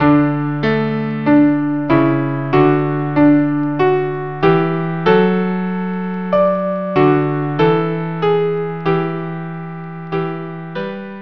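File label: viola cello; ave verum corpus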